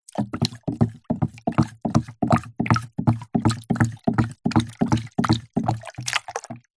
liquid water in plastic pot stiring movement swish with wood paddle